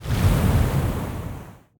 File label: submarines engines; World Of Warships